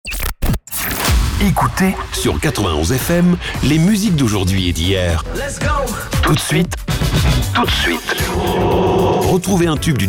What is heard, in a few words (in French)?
Jingle Tube du Jukebox